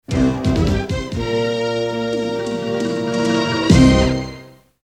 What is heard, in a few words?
music title card